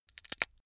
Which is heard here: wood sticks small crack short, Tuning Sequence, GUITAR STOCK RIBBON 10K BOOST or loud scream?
wood sticks small crack short